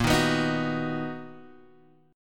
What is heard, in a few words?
B Major A sharp; B/A#